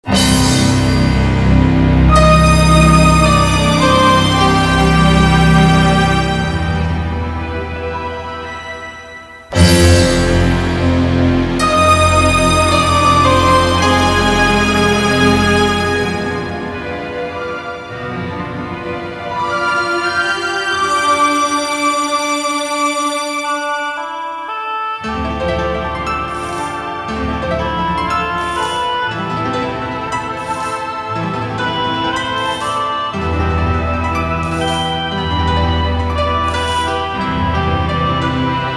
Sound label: Orchestral Ending